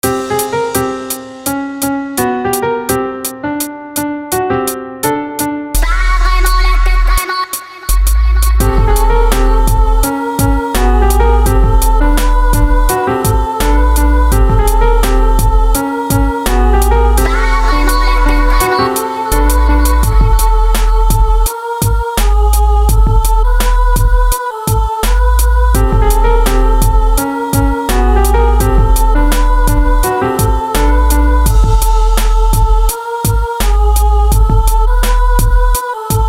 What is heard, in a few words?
Beats